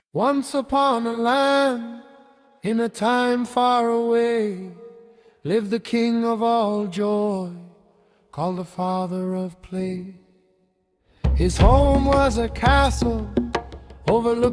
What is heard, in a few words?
african drums